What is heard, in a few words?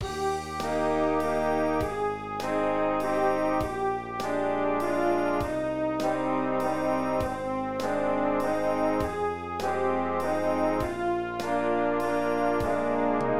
English Waltz